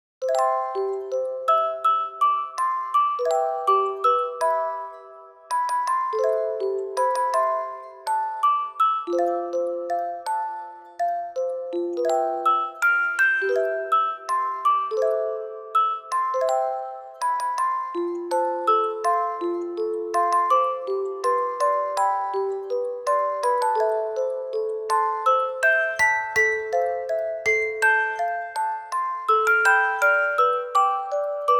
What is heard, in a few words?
music box arrange